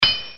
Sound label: hammer